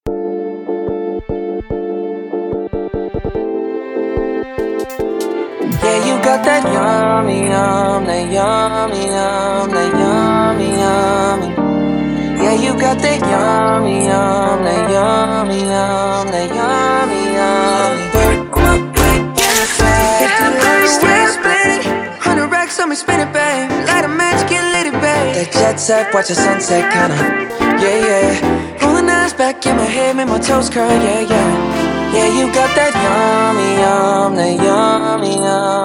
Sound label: Drumless Track